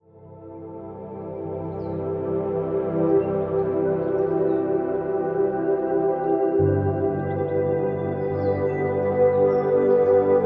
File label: relaxing music with nature sounds forest music sleep music meditation music